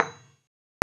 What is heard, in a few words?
THUMP